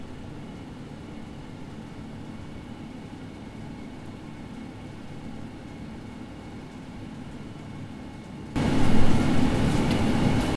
footsteps

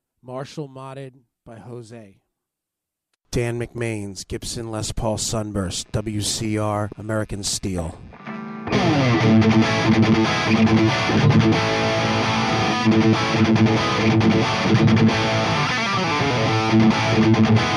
marshall 69 bridge; josemod

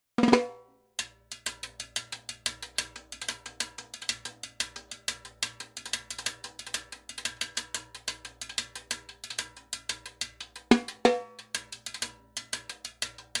timbales cascara samples, bpm 182; robert vilera

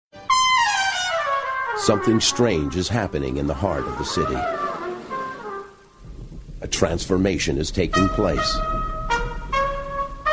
jazz

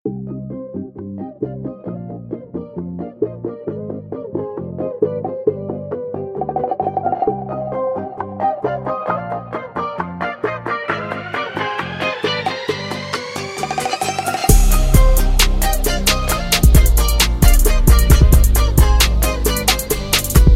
bachata trap snippet

